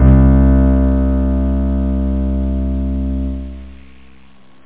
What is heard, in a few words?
PIANO